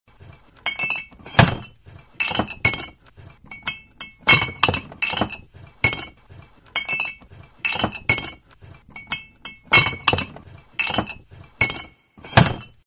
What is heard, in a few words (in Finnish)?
pullot